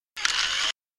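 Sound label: Mechanism Fast On off, servos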